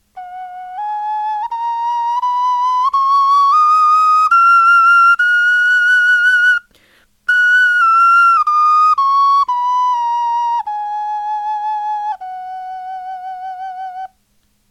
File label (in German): tonleiter, knochenfloeten